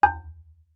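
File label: Marimba deadstroke fortissimo E4; MIS Pitches - 2014; Percussion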